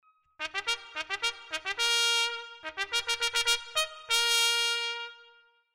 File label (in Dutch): Straight mute trompet